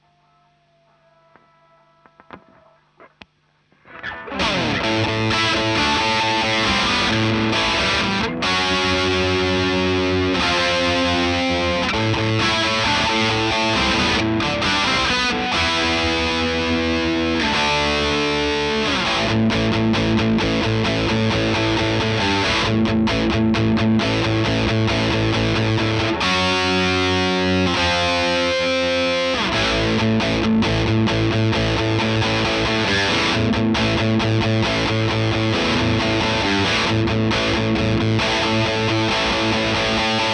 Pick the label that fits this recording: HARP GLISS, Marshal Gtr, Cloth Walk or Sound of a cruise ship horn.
Marshal Gtr